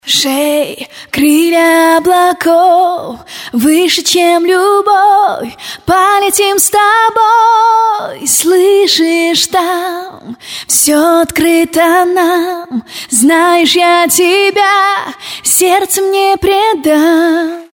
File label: acapella vcl; ringtones